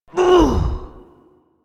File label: Injured Grunt